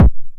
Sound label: 909 BD Tape Sat